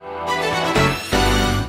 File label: notification